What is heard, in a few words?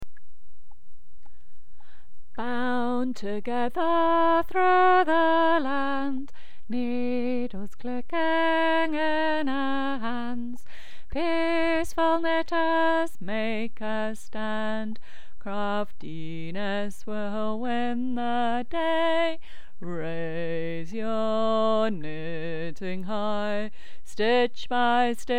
Bass